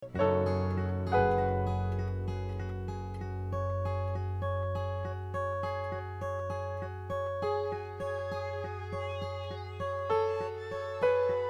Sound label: Synth unison piano